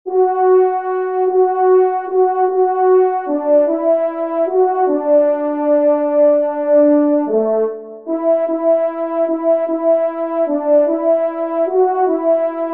TRP; Plaintes du Cerf

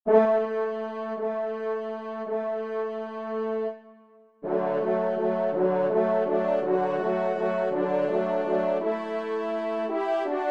Valse; Emile Rolle

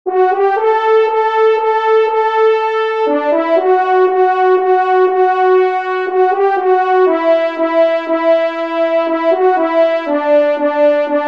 Valse, trompe; 1e Trompe; Lagard; Auteur : Arthur Lagard

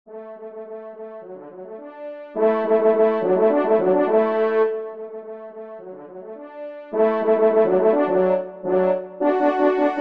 trompe; Souvenir de Beauvais; 3e Trompe

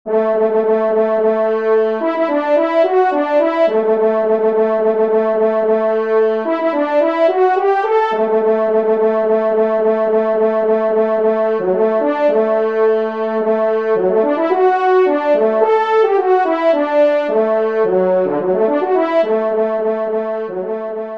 pas redoublé, trompe; Pupitre 1° Cor; Loisirs du Chasseur 6

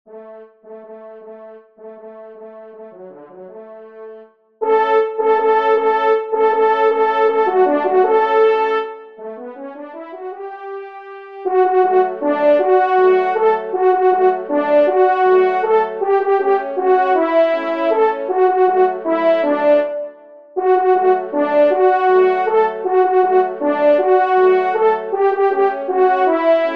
trompe; La Bourguignonne; Sombrun; 1e Trompe

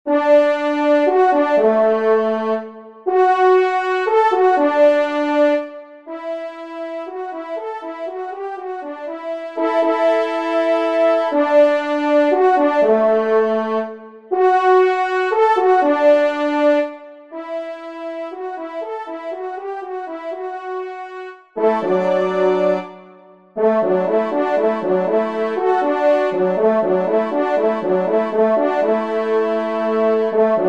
TRP; Joyeux Roland; M Sergent

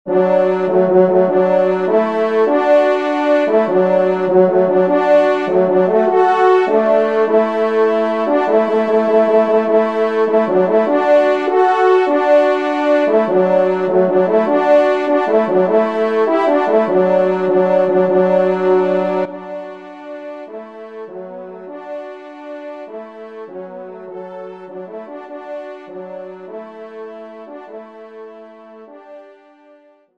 Sortie, trompe; Devert; Sortie 1e Messe

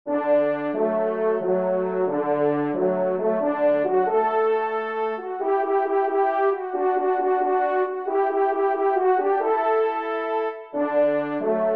pas redouble, trompe; La Bidart

